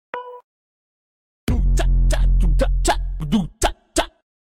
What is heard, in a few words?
Funk